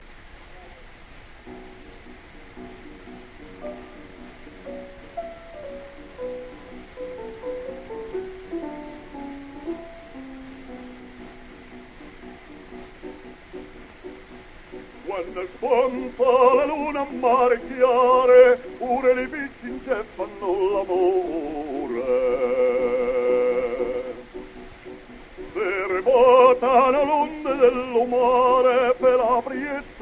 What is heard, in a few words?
Gramophone